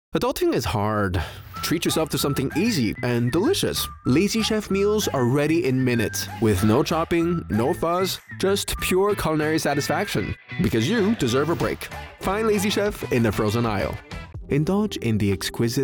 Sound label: Song